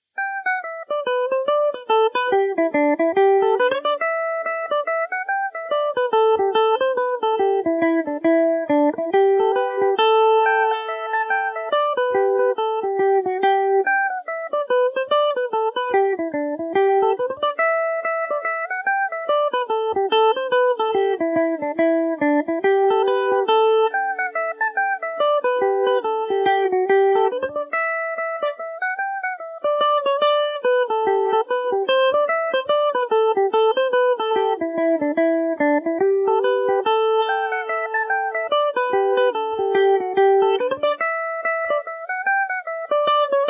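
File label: G major revelation, irish mandolin; harp and shamrock; The Harp And Shamrock; Revelation Jaguar electric mandolin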